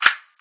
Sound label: Unlock, phone ui; Vivo; X1